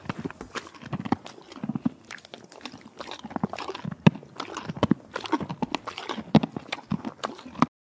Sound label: Horse eating apples and carrots